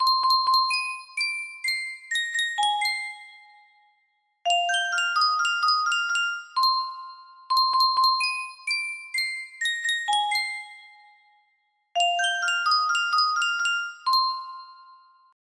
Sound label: Sankyo Custom Tune Music Box